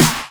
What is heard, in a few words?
Snares; Vengeance - Essential Clubsounds Vol.4